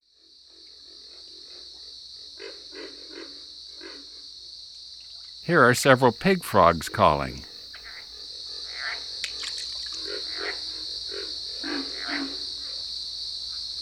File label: Pig Frog; Eastern North America; Dan Gibson; Wildlife Identification By Sound